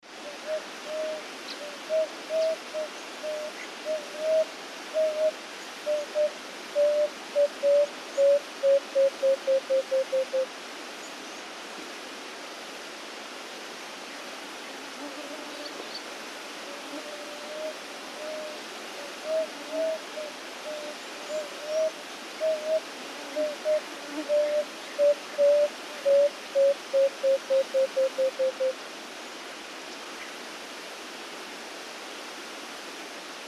Emerald Spotted Dove; doves african; Chobe, Botswana

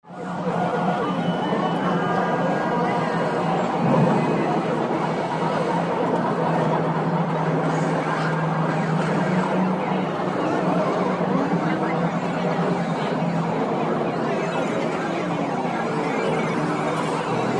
Video Game Arcade, video game

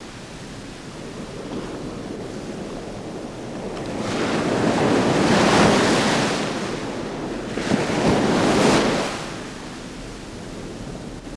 Wave Crash Dbl Sizzle

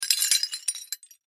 Wood Tile Lrg Squares, debris

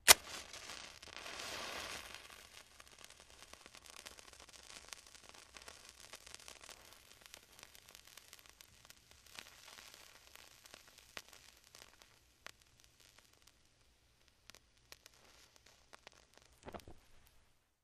Match Light Strike; lighters & matches

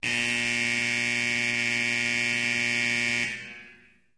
Ice Hockey Arena Buzz

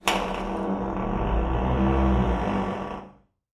door creak med, squeaks

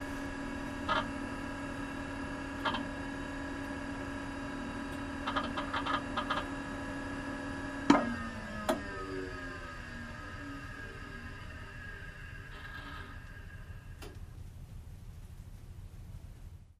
Computer Off Interi, computers

